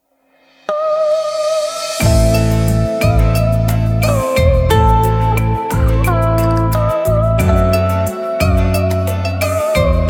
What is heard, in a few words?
beat, playback